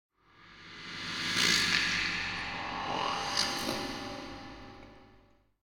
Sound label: Flying Female Ghost - Hover And Growl; Hollywood Edge; sound designer's toolkit v2